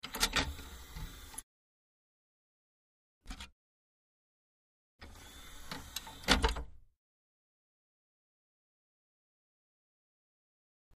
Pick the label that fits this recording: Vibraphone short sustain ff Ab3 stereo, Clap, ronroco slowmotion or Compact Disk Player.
Compact Disk Player